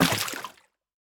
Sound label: Footstep Water; Opsive; UltimateCharacterController